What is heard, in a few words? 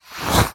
cloth frou